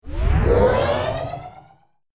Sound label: futuristic zoom move